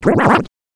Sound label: fall; alienarena; grey2